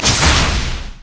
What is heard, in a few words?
power transfer; LoL; viktor